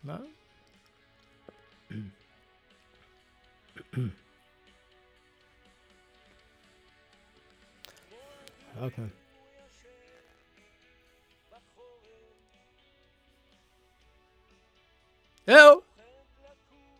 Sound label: VOC